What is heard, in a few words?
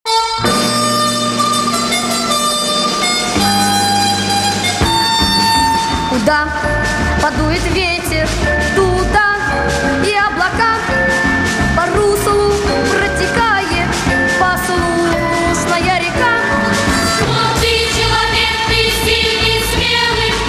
electronic